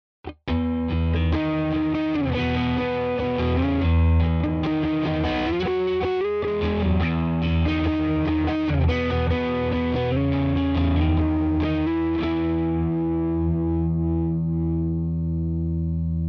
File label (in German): JA 60 CC all positions Rhythm Oktaven